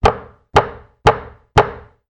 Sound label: impact gavel hit courtroom x4; zapsplat